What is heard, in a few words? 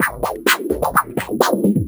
VFX2 Loop 128 BPM